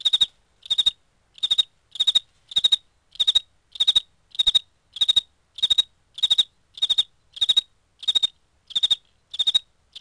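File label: insect loop; DAoC; Toa; Gamestar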